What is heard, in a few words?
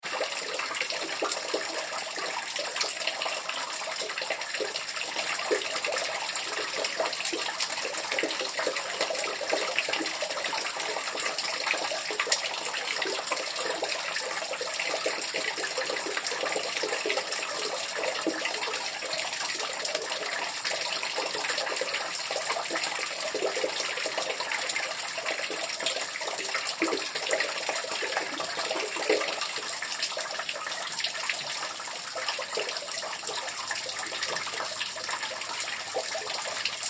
Water Drips Sewer Runoff; Sewers; Backgrounds; BigRoomSound